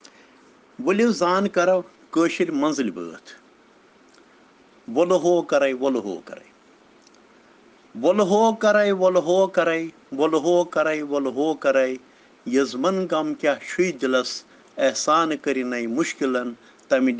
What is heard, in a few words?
Children Rhyms in Kashmiri